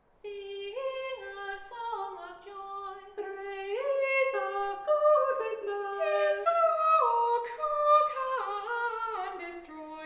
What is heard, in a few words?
Upper Voice Sing a Song of Joy